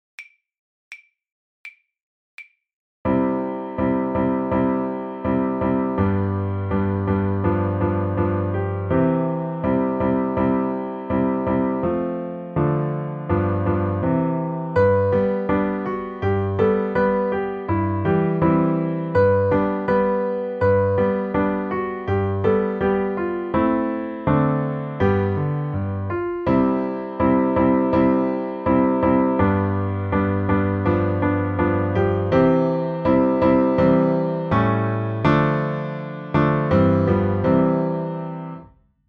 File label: accomp, level2violin; The Violin Fun Book (Level 2); Loveley Creature